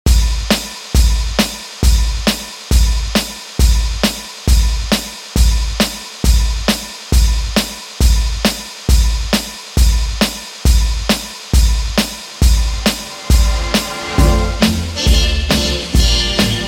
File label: 60s Grid Redrum 136Bpm